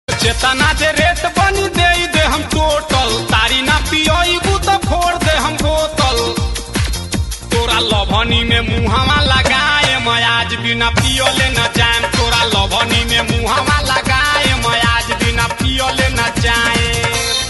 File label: BHOJPURI RINGTONE